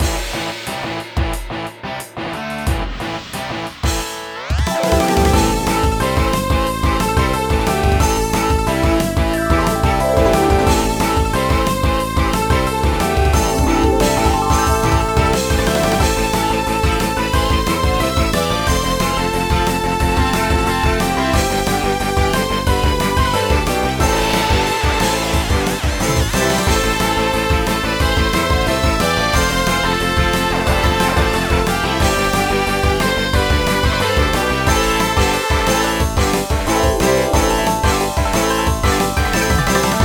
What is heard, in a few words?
Bgm; trial; spring onion